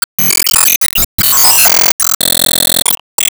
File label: Sys S Rank Complete